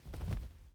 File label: Swirling T Shirt